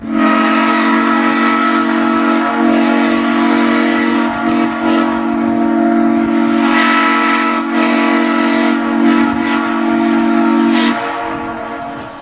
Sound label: horn